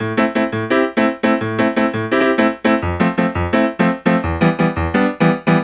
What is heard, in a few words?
Piano